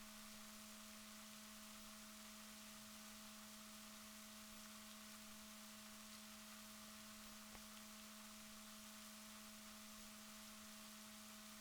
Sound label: rorquals positif, Balaenoptera Physalus Chile